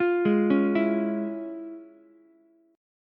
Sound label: F Minor Major 7th Sharp 5th Ukulele; FmM7#5 arpeggiated; JGuitar